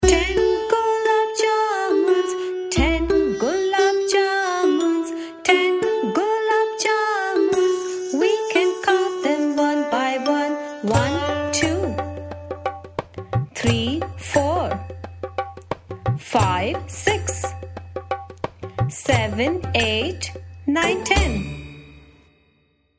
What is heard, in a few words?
Counting Song English